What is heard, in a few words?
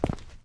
concrete